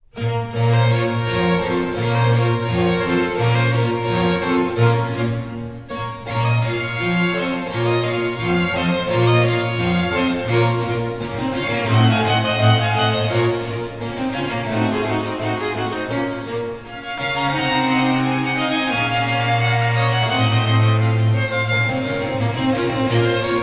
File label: concerto for cello in b minor allegro